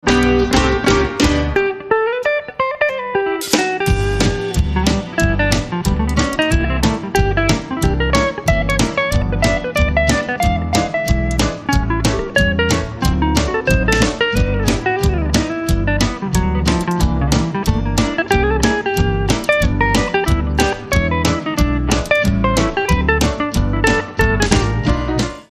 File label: Cedar Fever Solo